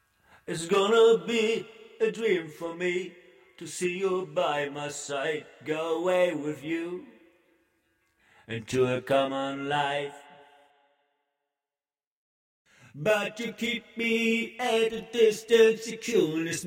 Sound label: folk 120 C, acapellas